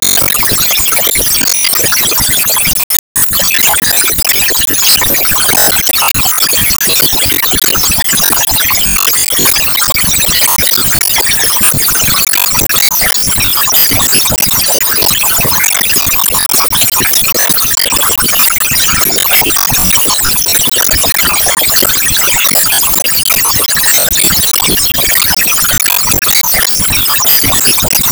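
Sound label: Bgm Stage Clear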